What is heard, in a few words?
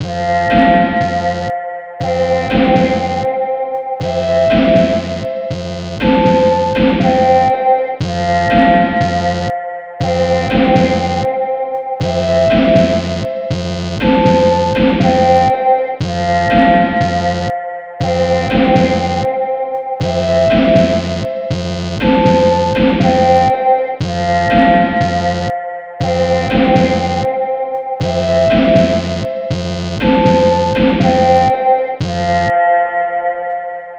Sinewave